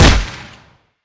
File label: Weapons Snare; DCS